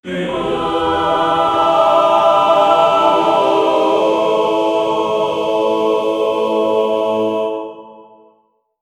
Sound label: soccer videogame start cr, sport